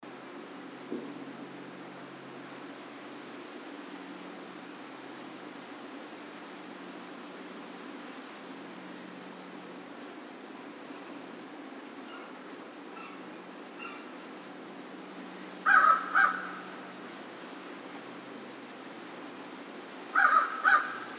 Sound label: American Crow